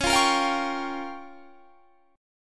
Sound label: G Minor 7th Flat 5th